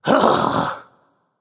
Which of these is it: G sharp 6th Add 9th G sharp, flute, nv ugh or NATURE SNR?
nv ugh